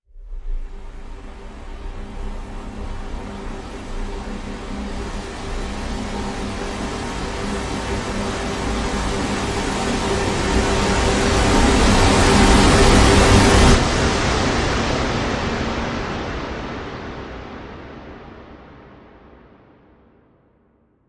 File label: Rise Long Horde